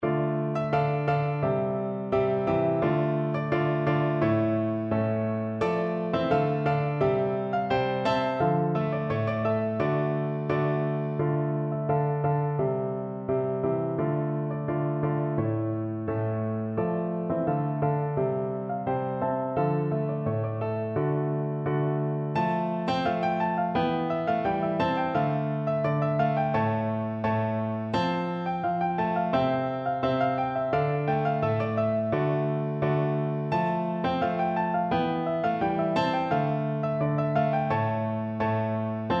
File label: bransle, piano; torche